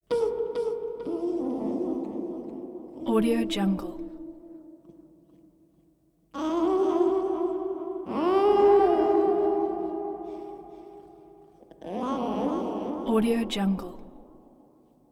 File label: fetus in womb ambience reverberant infant moans